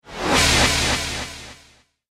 Laser Cosmic Spark; Laser Sound Sound Effects

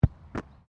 SOCCER BALL BOUNCE CATCH GRASS

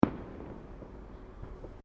Fireworks Single Firecracker; Backgrounds

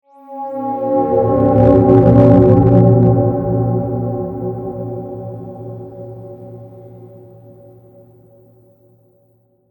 Space Ghost Moan, Space, Ghost, Moan, Bend, Eerie; 5.1

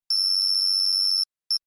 Beeps Data Transmission Technology

